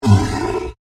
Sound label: Monster Grunt, Horror, Monster, Grunt, Creature; Monsters (Sound Effects:Horror); BlastWave